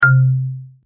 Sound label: notification; Mav Mobile UI